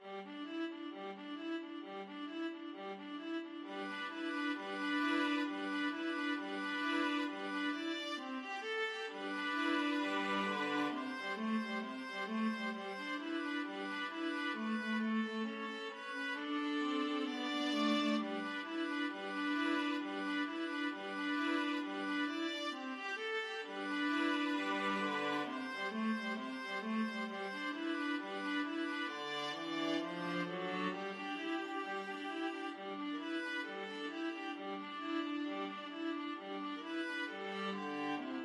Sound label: jingle bells boogie viola duet